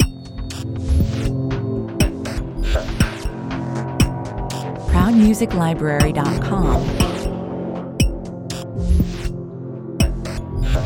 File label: technical future intro 60 bpm